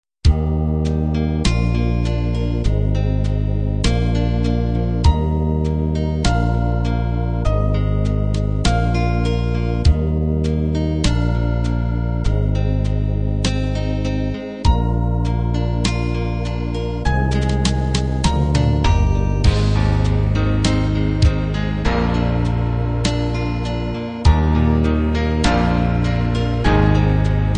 country-pop ballad

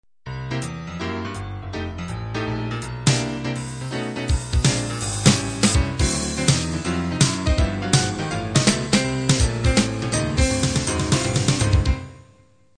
boogie woogie intro

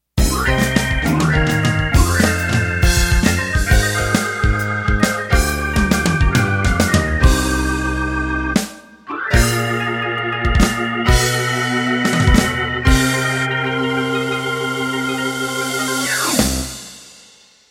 Gospel Rock End

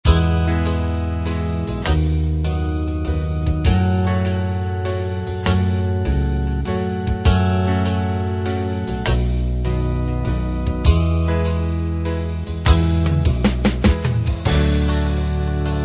gospel blues

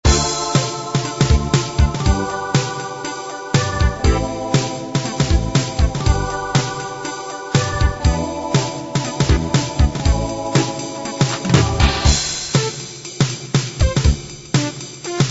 hip hop

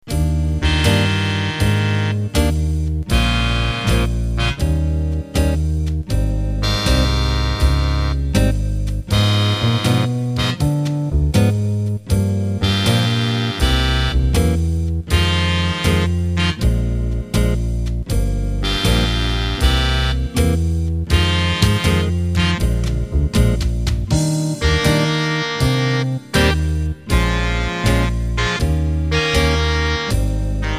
Big Band Slow; featuring Acoustic Bass, Muted Brass, Saxes, Nylon Guitar and Brushed Drums

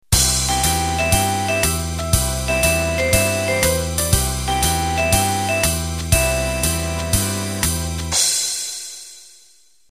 cool jazz intro

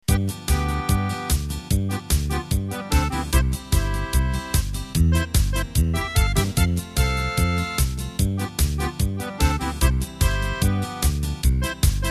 Polka Bavarian; Electric Bass, Accordion, Acoustic Guitar and Clarinet. Great for German Style Polkas and that Oktoberfest gig

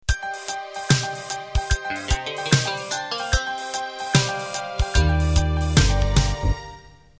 Swing Rock Intro, hip-hop, MIDI

